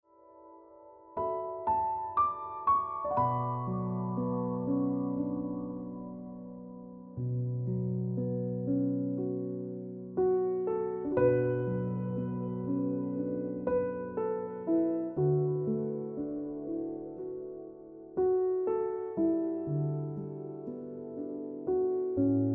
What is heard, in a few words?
Piano Music; Ringtone